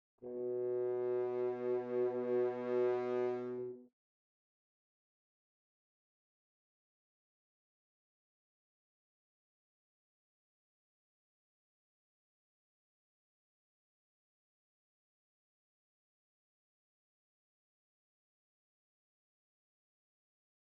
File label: Horn